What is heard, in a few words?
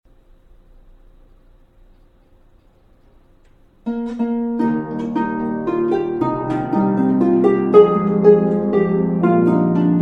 Prelude Piano Harp; Easter Sunday